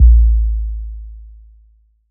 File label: Kick Sub 808 Long A1